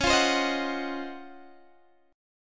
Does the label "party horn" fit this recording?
No